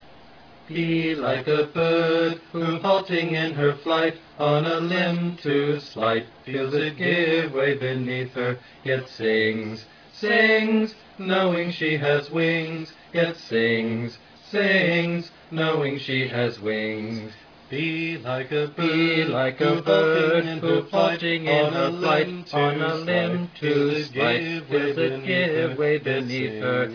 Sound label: bird, panpipe